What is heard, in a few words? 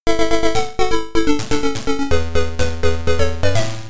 Level Complete, Atari ST; Pang; Buster Bros